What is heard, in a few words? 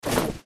loot